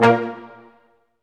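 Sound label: BRS Large Ensemble, Staccatos; Roland LCDP08 Symphony Orchestra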